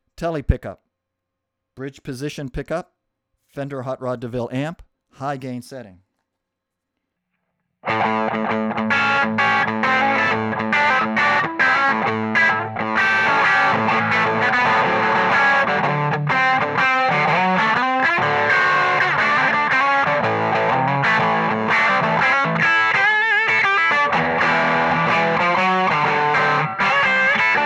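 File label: tele bridge hot high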